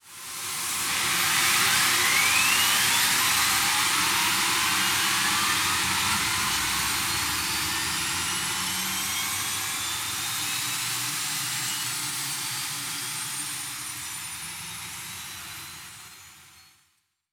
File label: Aircrafts Engines Engine TV3117 Start; DCS